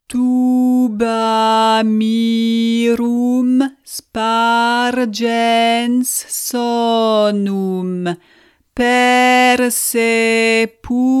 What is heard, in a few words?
Requiem Tuba mirum Slow